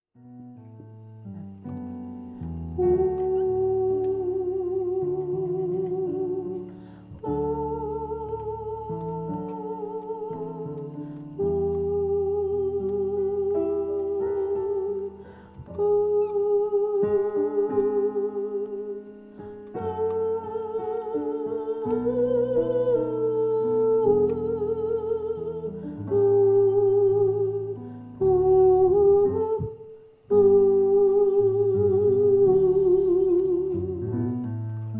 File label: SOPRANO